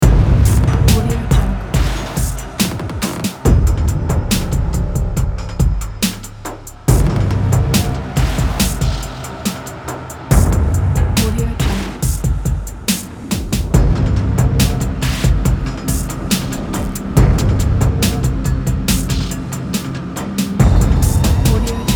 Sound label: trailer underscore ident